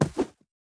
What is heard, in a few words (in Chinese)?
犰狳滚动声